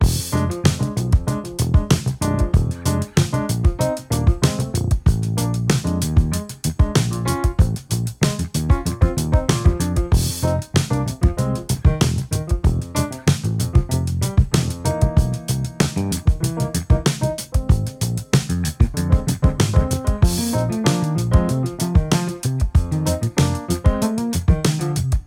bass; funkastic; sampleson

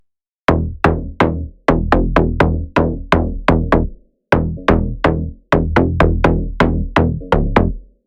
warm mid bass dry; kern audio

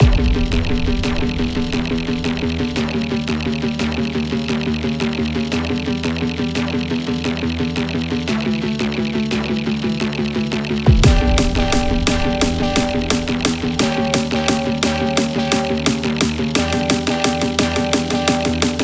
drum and bass

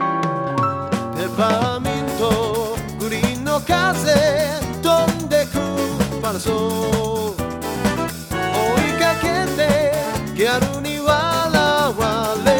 piano dry; wide range focus; audiobrain

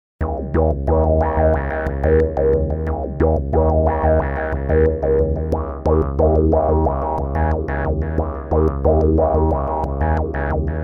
big seq riff

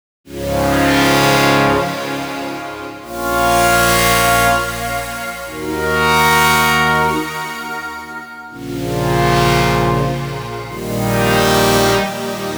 deep wide sea pad; the digital life

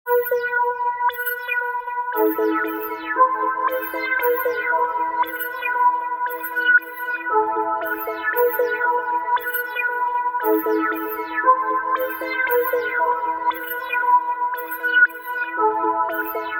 drip, trap; 2 8 expansion trap pack bundle purple edition

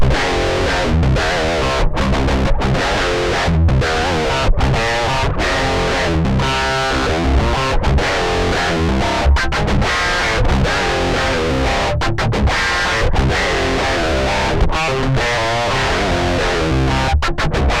rock riff; tl distraptor; tonelib

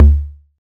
low tom; hyperfocus dsp

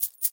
5 coins double pay metal; spare change; nova sound

